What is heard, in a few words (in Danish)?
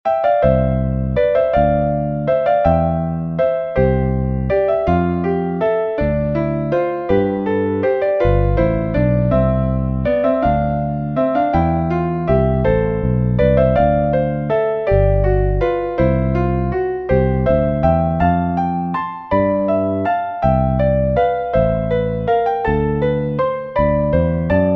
Vals